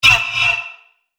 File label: Laser Robo Breath, sci-fi laser sound effect